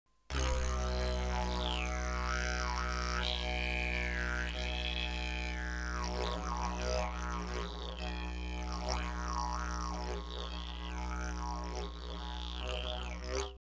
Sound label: Didge